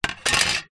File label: transport license plate down on surface